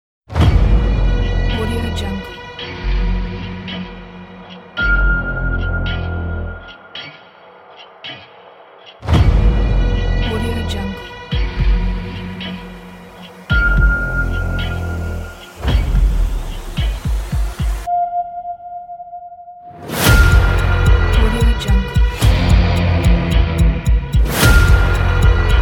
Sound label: countdown dark trailer; roguestarsproduction